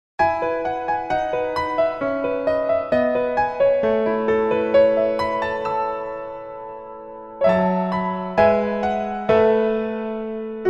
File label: Piano ver